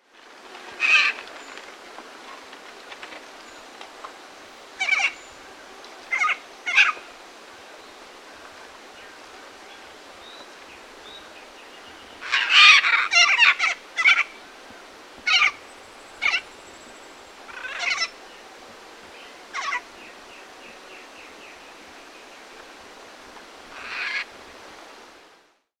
Ara severus

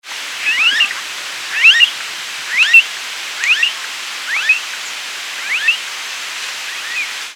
Crotophaga ani

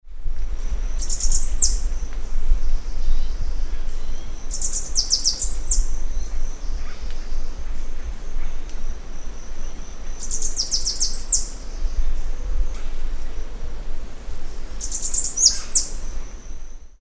Coereba flaveola, Platanero

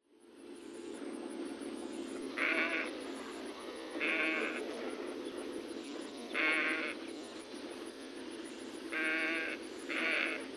Morito común Plegadis falcinellus

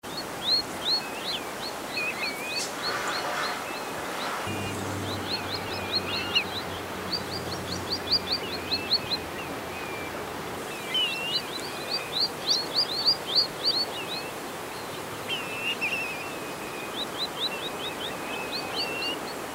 Spotted Sandpiper Actitis macularius

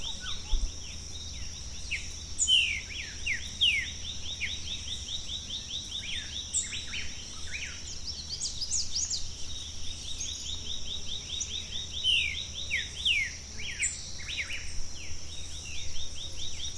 Saltator striatipectus Pepitero Listado

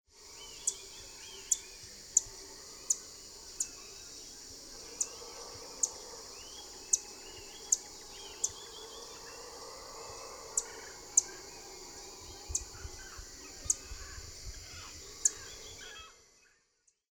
Setophaga striata Reinita estriada

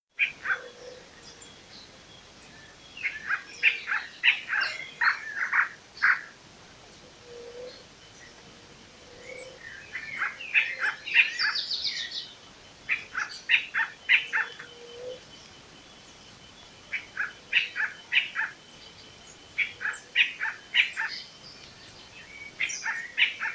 Cercomacra nigricans

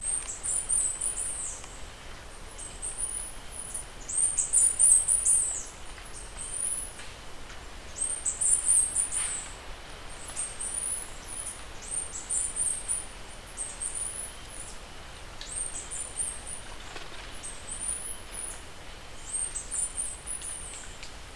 Arremon brunneinucha

